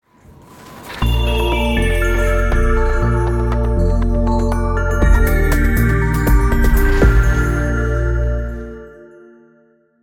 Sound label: Skiing jingle